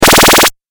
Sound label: 8 Bit Style Laser Pulse; Video Game; 8-Bit Style Laser Pulse 03; Video Game Sound Effects